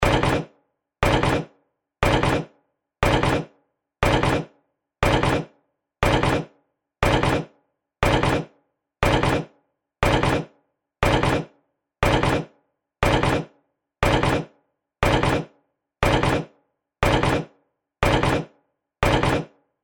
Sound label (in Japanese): 秒針; 演出効果音